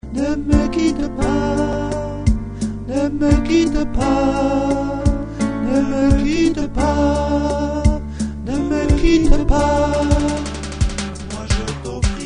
soprane contrechant

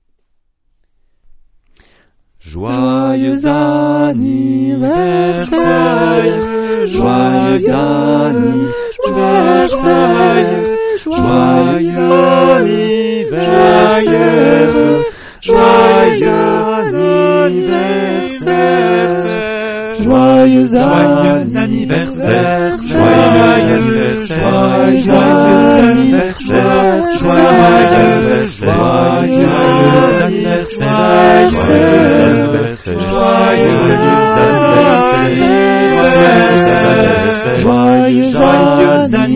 joyeux anniversaire tutti

